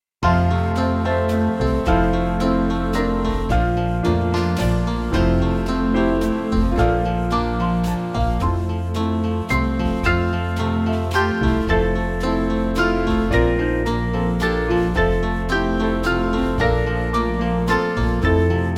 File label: Band